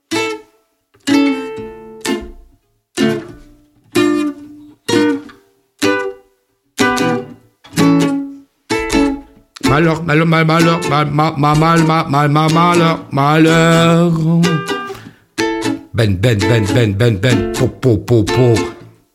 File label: Dub